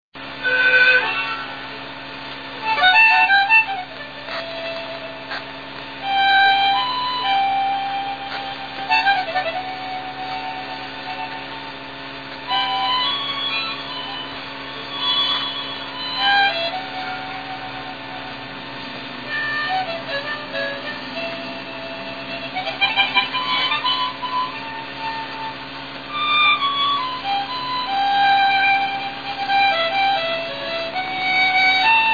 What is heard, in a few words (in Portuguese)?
gaita